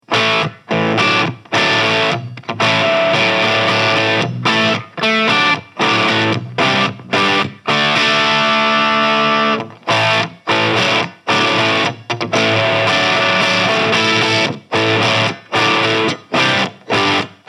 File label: Crunch; Solar Guitars T1.7AD Aged Natural